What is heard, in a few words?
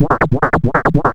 C#, Synclav FM, FX; Big Fish Audio